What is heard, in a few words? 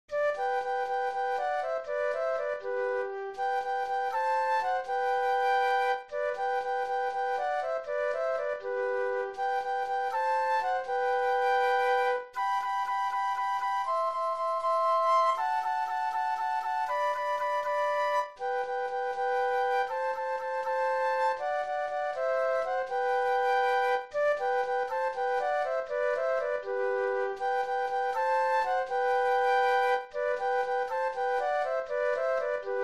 flute duet